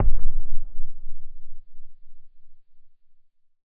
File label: explosion far distant; Gamemaster Audio; Explosion Sound Pack